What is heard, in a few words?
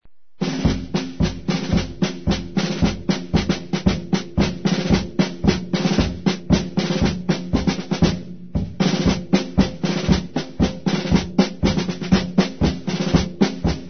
DRUM; Marcha